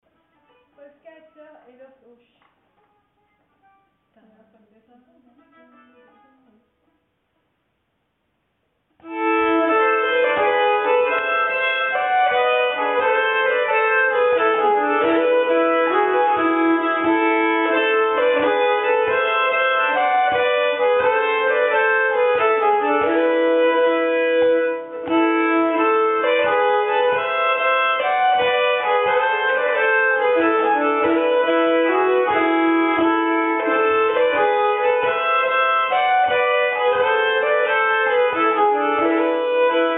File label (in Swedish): Polska, nyckelharpa; Polska Evert Ahls